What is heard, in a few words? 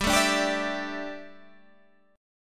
G 6th Suspended 2nd; G6sus2 strummed